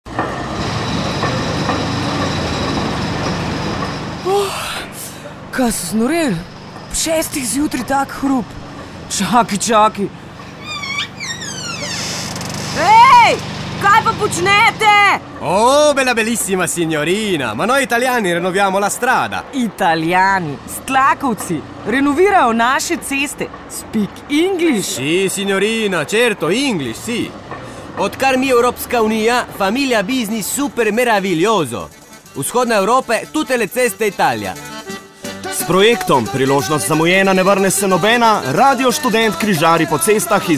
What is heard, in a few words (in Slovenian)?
ITALIJA JINGLE